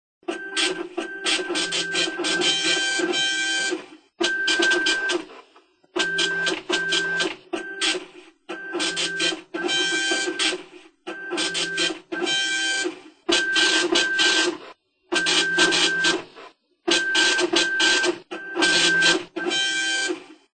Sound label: Dot Matrix Printer, ringtones; Microsoft; Windows Mobile; WrongTech